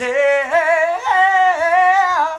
ehee; metal sonic

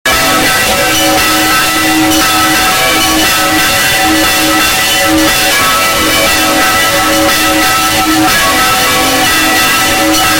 kolokolchik bass

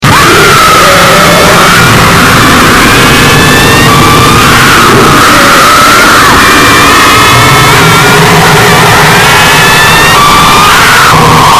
scream

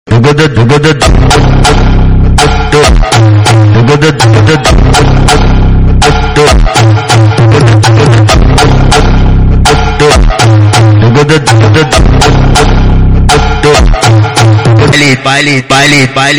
beat funk estourado

